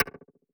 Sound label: back, ui; Portal 2